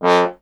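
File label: bass trombone F; Miroslav Vitous; Solo Instruments VOL-1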